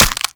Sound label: Infested Minion Bite